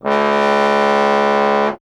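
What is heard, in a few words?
bass trombone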